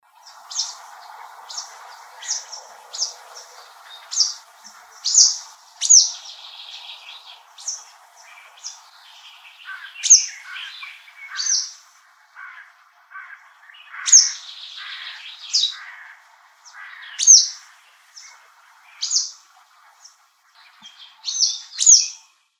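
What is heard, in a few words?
western yellow wagtail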